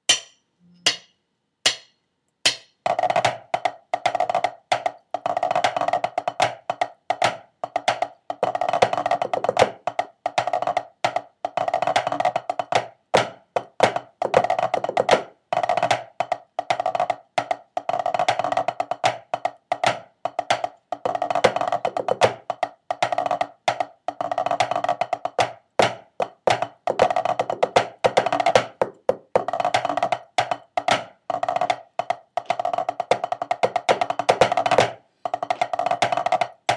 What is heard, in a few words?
snare tenor; the drunk piper